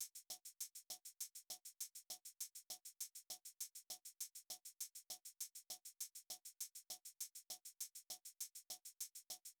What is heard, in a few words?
Drum Loop - 100 BPM Shaker, Latin; Loop Stems; Cymatics; PARADOX II - Drum Loop Collection; Flame